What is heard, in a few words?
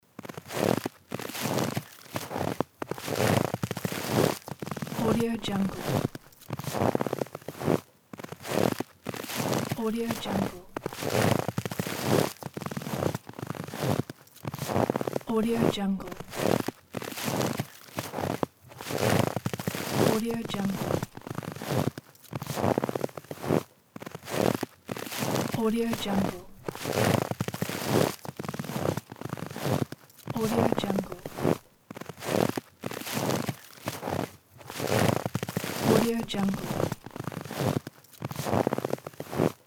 steps snow